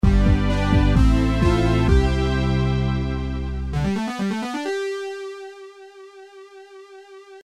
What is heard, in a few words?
JINGLE LEVEL COMPLETE; CELTIC HEART; MSX; PC